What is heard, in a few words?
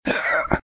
hurt; RadwinIsland